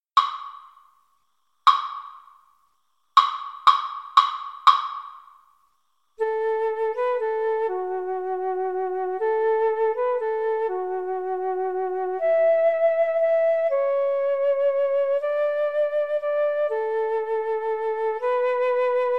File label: silent night, Violin Flute Duet